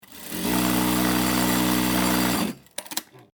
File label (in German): Naehmaschine